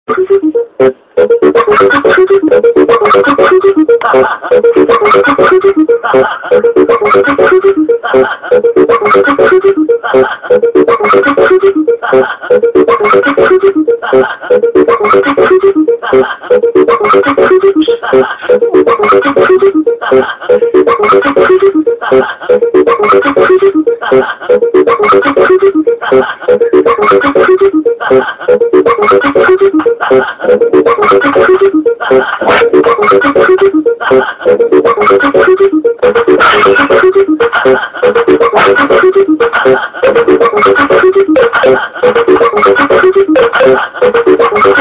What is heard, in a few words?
nightmare laughs